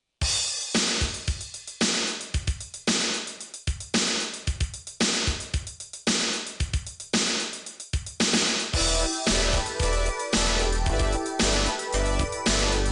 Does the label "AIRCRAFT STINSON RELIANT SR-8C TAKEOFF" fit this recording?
No